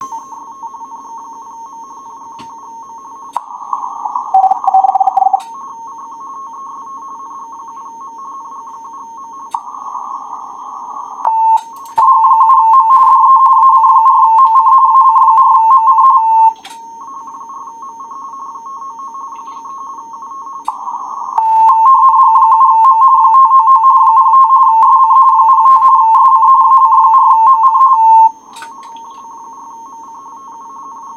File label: Key - Unkey Pop Samples; Elecraft K4; CQWW RTTY Pops